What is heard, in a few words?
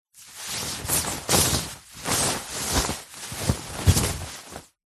fabric nylon thin handling movement; foley